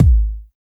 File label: R+B KICK; Drums In Your FACE!; BONUSKIK